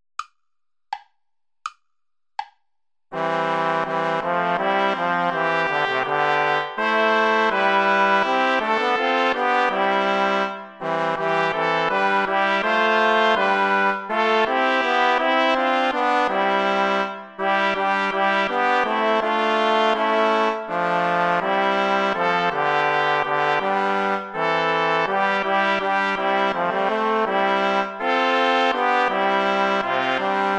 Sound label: play along without trombone; praetorius michael; herzlich tut mich erfreuen